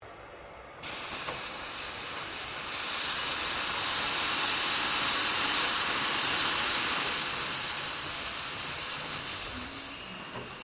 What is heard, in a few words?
Steamtrain Venting; Bellarine Tourist railway vehicles; Trains